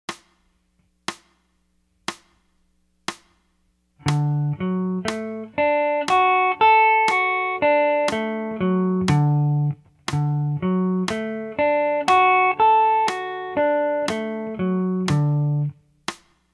arpeggios; chords

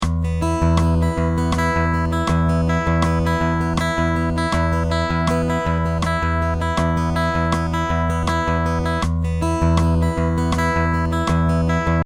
fingerpick sixteenth, guitar fingerpicking

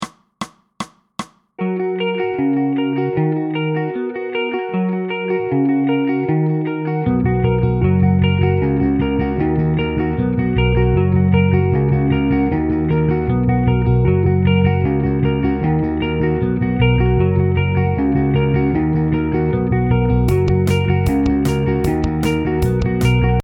intro with drums, guitar

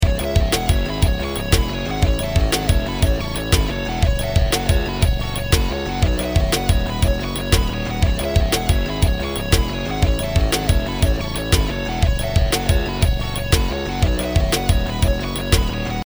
high guit

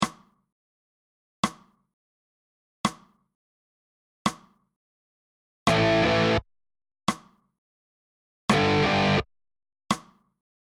half with drums

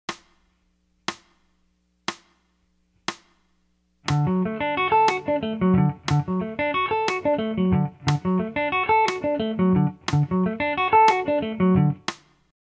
arpeggios; chords

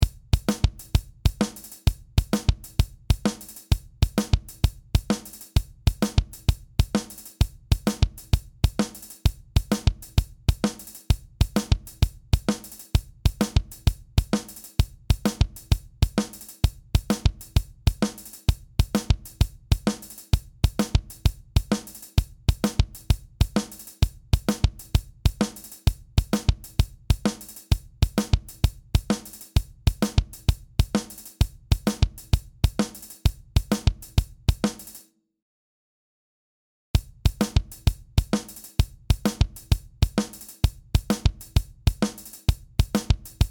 drum test 130 bpm; guitar; jamtracks

